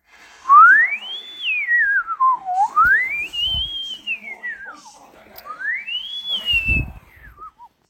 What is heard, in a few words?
onde radio ringtone